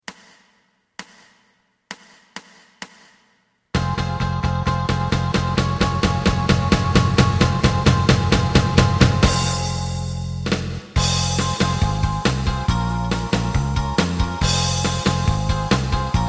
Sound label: lead